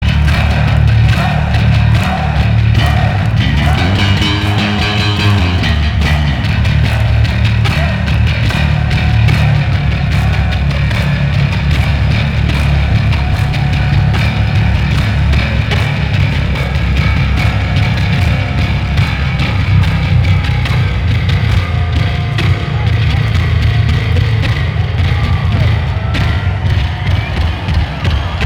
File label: Bass Solo